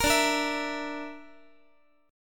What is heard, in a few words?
D Suspended 2nd Sharp 5th D, Guitar; Dsus2#5; strummed; JGuitar